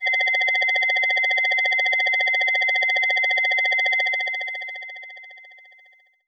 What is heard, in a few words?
Helicopter FX DX100 E6